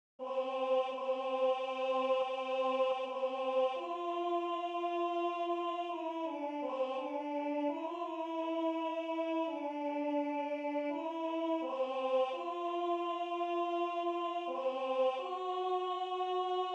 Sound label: Alto